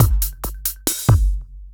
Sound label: percussion loops, Techno; PS-45 WAV Techno ID